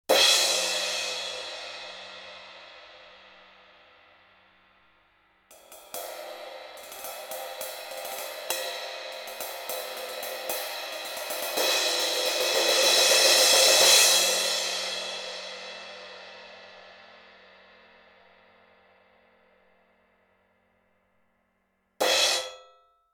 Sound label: classic crash paper thin 17, turkish